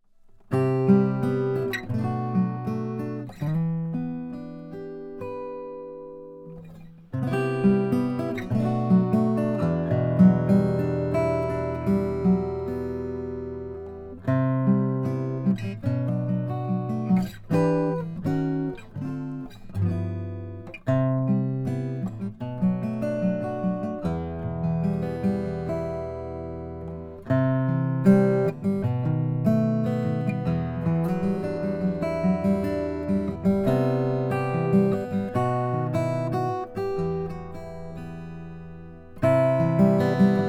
bourgeois oms koa; namm

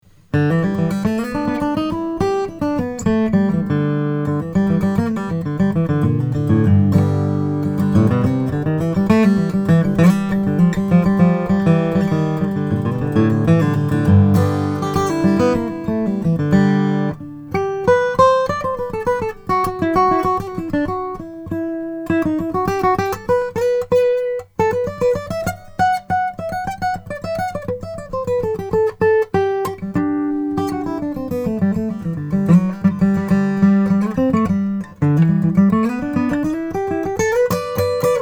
cw adirondack top, guitars; collings

